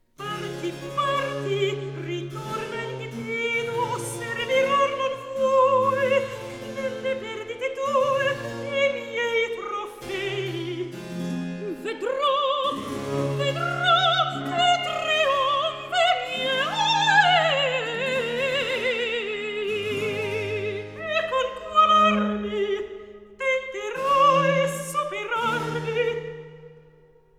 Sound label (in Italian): Recitativo; Parti, ritorna in Gnido; Alessandro Scarlatti; Duet Cantatas